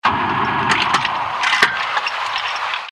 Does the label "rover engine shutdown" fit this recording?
Yes